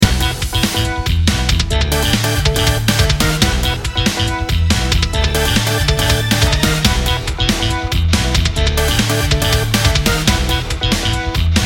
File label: Victory Loop; rpg maker mv